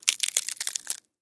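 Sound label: wafer sounds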